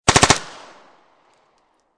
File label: MP5 9mm 5 Burst Field; Machine Gun; Machine Guns; VRSonic